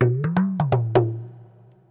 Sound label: PERC LOOP ETHNO 125; eJay